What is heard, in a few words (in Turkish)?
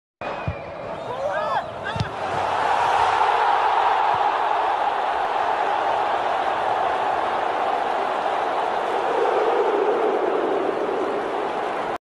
gol sevinci ve taraftarlarin huh sesi; c ronaldo